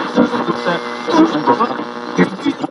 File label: AM Radio Tune; Communications; 1001 Sound Effects